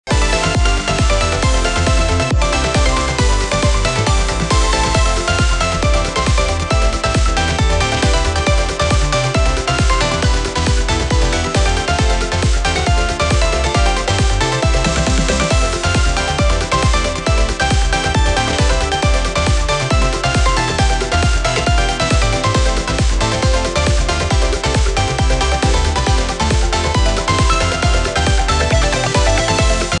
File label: create an energetic and upbeat electronic music